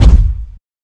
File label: Walk; Seer; GamigoNA